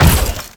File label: fall pain; Dystopia